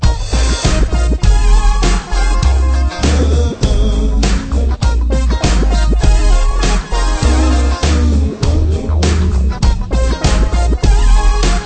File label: Menu Loop